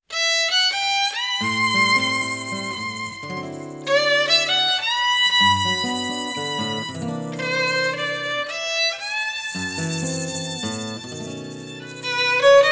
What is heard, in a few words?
Foreign Folk